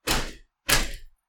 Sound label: Metal Impact